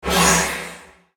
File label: element darkness; maoudamashii